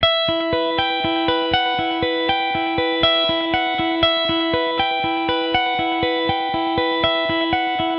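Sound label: Guitar Alternative E minor 120bpm; Guitar and Bass; Ableton; Factory Packs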